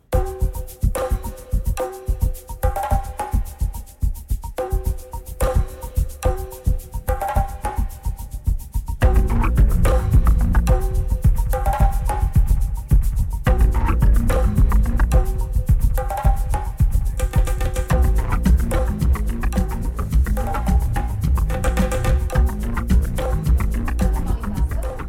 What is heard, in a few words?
Trance